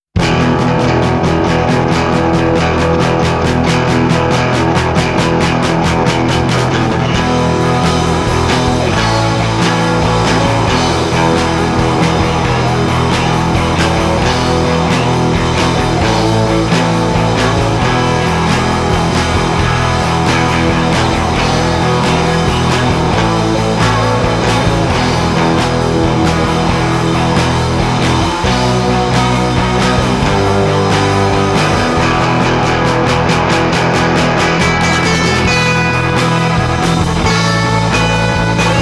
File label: thundering guitars